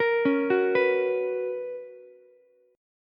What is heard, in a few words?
B Major 7th Suspended 2nd Ukulele, chord; BM7sus2; arpeggiated; JGuitar